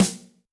Wood Snare; Yamaha DTXpress-II

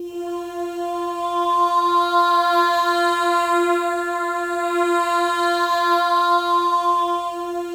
MOVING V4, Symphony of Voices; Spectrasonics

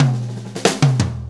4-4 Fill, Drum Loops

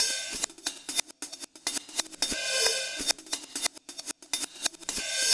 Cymbol Particle; Particles

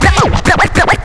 scratch; Zero-G; Freak Beats

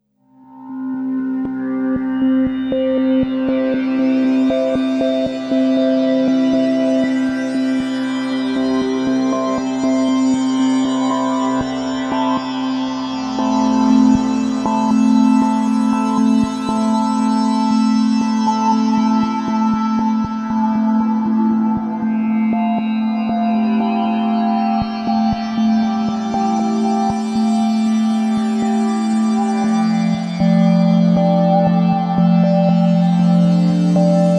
Melodic Solo; Sonic Foundry (Sony Creative Software); Slow Silhouette

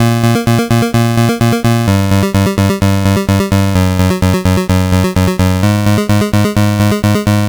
Game Boy Bass; Nintendo Game Boy

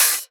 Open HH, Cymbals

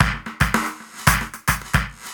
CB LOOP; Zero-G; Chemical Beats

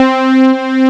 MOOG STRNG C3; Keys & Pads; AKAI S6000 CD-ROM - Ultimate

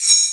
Ambient Rasp, EMU Proteus-2000

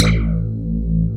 wet bass

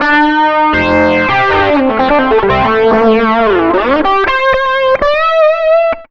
SOLO L, Electric & Acoustic Guitar Loops; USB Soundscan vol.04; AKAI; 120 SOLOS

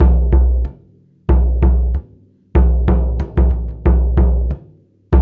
Hip-Hop Gong; Spectrasonics - Supreme Beats - World Dance

